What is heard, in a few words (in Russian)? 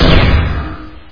pozhar zvonkii blizkii rezkii